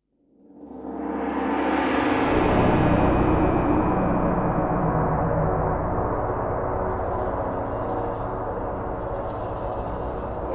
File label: instrumental version